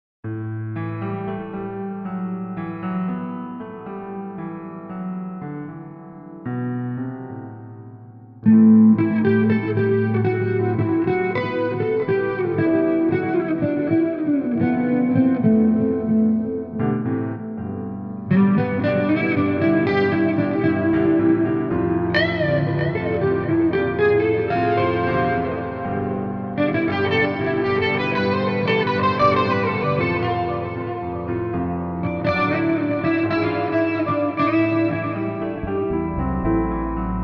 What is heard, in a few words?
Piano in Battery Park